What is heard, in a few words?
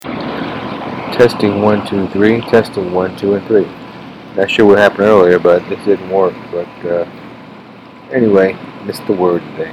Adagio For Strings